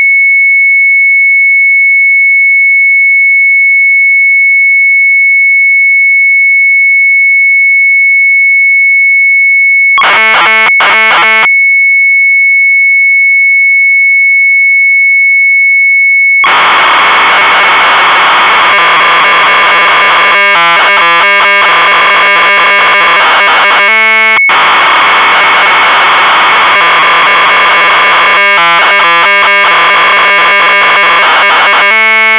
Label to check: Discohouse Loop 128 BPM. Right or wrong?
Wrong